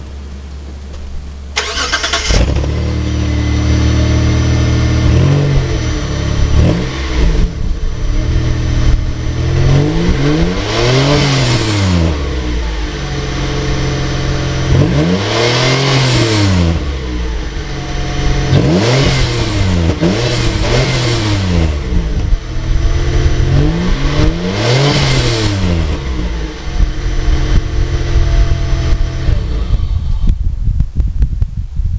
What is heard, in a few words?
stealth exhaust stock; garage; mods